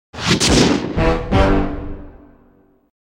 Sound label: Mission Complete; Sly Cooper 3 Honor Among Thieves